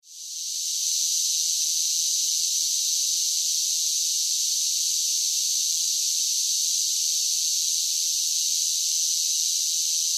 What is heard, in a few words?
Insect Cicadas, Sing; Animal; Insects; BlastWave